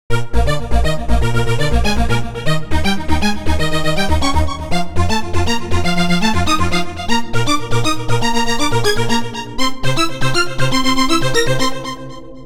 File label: boron arp; the space soundset